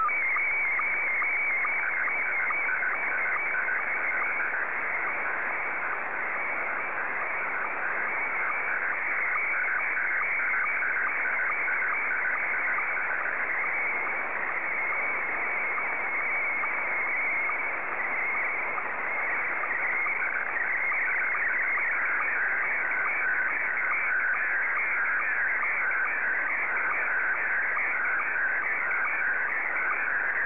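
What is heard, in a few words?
Scottie 1, slow-scan television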